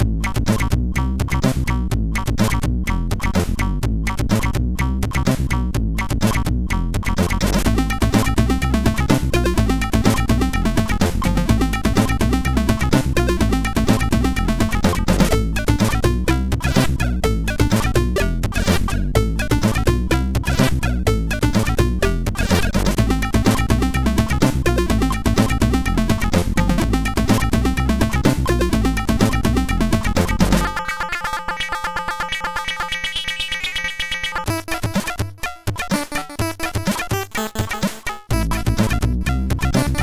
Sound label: sid, MOS6581R4; Caps House